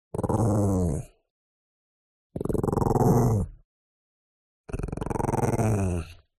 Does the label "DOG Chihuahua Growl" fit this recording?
Yes